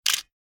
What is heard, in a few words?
camera shutter; m5stack; StackChan